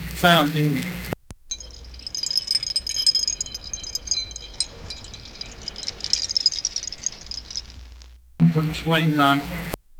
ambient hum of emergency, display

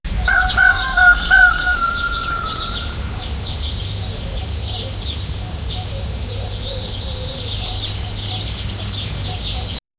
a peaceful village early, early morning

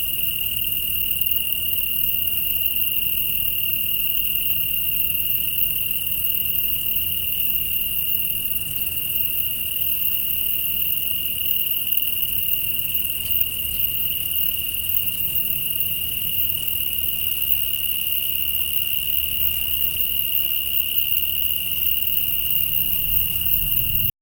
night cricket field, countryside

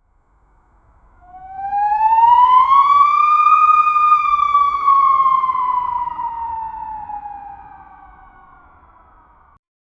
distant siren wail; emergency

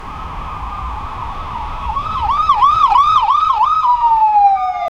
sirene of an ambulance; emergency services

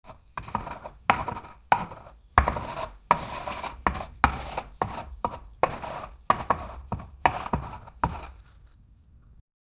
chalk on concrete

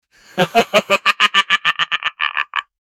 evil pirate laugh

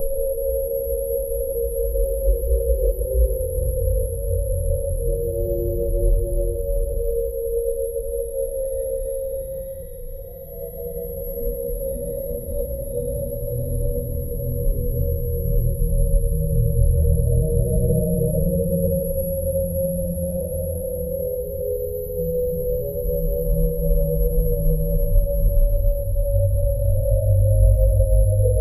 a horror atmosphere backg

